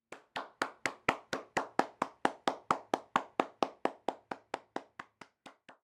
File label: Clapping Hands